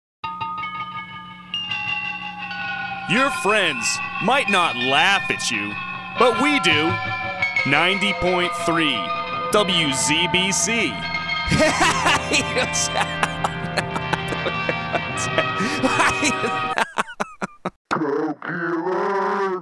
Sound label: we laugh at you; It's different An accident when the reel came off the spindle